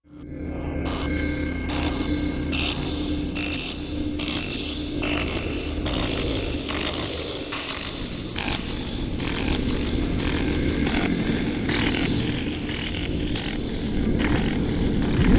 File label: Bug Light Electro Arcs Sweep Over Low Ambient Drone